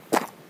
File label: Stony Step, outdoor, Footsteps